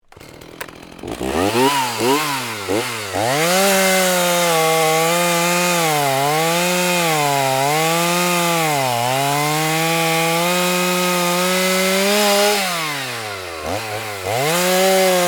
Chainsaw Cut Logs, Industry, Chainsaw, Cut, Logs, Sequence; Saws; Sound Effects:Machines